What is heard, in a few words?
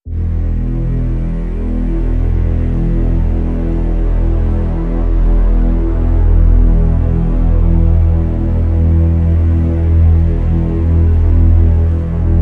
Drn Ground Zero E